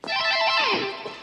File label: riff